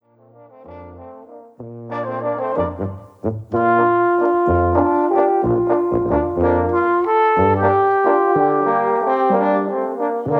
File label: Liebeswalzer Love Waltz